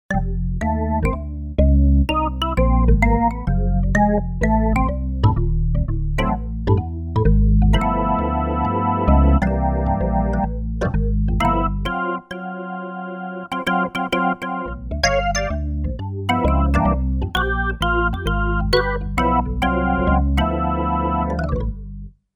Jazz Organ; Factory Patches

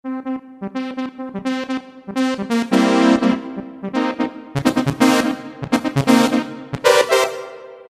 Velo-Brass, Roland PN-D50-01

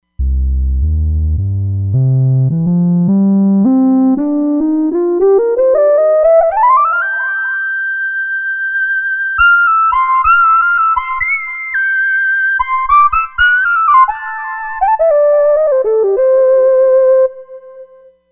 Modular Lead, Roland Fantom XR

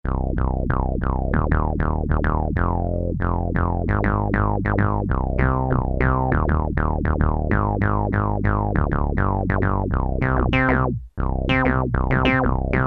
Synthe Bass; Factory Presets Bank B

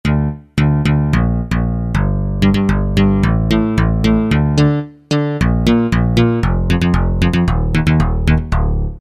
FM Pluxx; Factory Preset examples

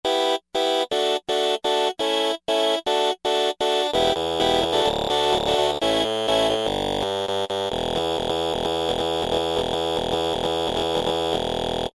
SpectrumWave, Roland SRX-05 Supreme Dance

